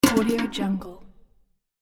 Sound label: metal bucket rolling; domestic sounds